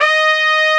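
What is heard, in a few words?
Warm Trumpet d sharp 5; Nintendo; July 2020 Gigaleak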